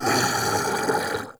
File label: Flying Mouth Death; Warframe; ManInTheWall